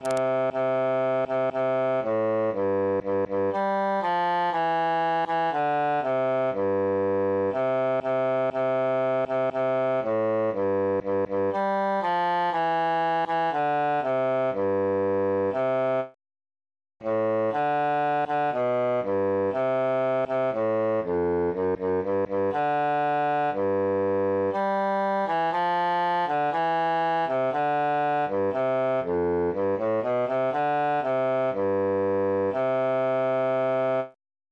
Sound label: bassus solo; since first i saw